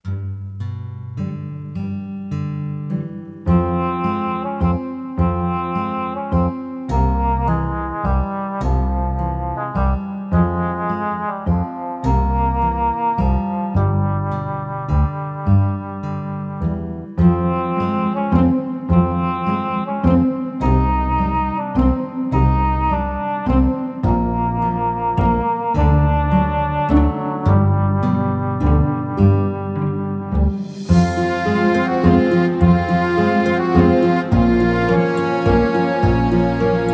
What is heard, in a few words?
Walk Plank; The Hardy Boys The Hidden Theft; wii windows